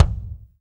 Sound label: BD, DR BD; Best Service Gigapack 2